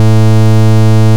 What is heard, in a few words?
Bass Root A